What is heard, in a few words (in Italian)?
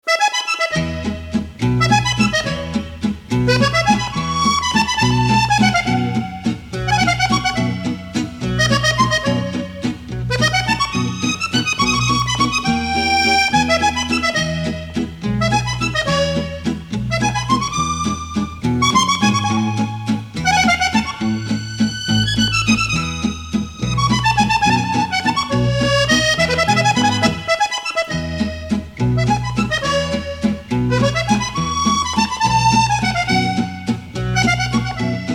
suona fisarmonica